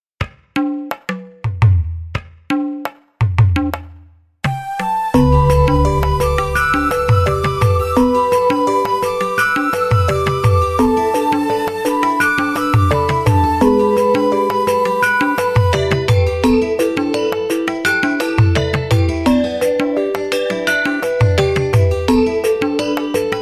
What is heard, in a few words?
degung angklung